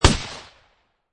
Shot Gun Remington 870 12 Gauge Single Shot Designed